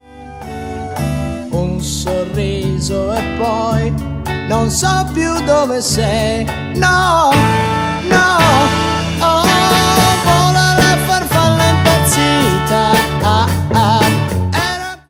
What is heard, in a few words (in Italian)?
Provino